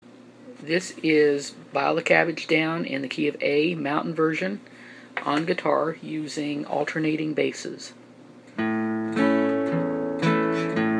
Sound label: Guitar Alternating basses key of A; Bile Em Cabbage Down